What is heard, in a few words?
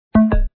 on release; operation graduates